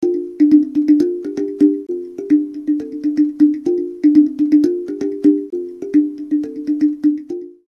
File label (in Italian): xilofono